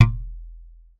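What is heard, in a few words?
thumb, bass; Xsample VOL-1; SLAP+THUMB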